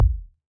Ball Collision Geo Tackleball Light

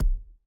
Ball Player Hand Hit Tackleball Extra Light

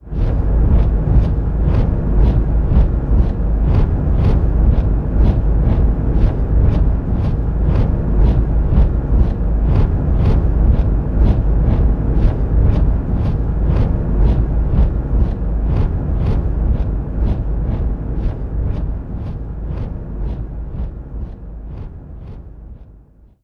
Spinning Arm Fast; Scraprun; Deathrun

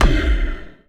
BallHit Hard Breathy; Spinal Serve

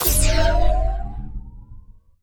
Place Heart Ball; TechTree

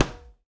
Tennis Racket Ball Hit Hard

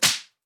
Stickers OnStick Slaps; Sticker Packs

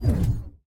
Hand Paddle Release Hard

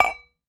Ball Collision Holiday Mug Med

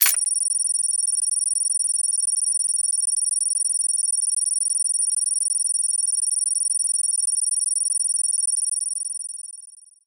Grenade Throw

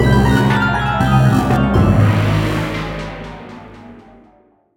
Music Quest Fail

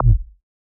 Ball Collision Forcefield Extra Light

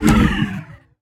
Disengage; Heartball

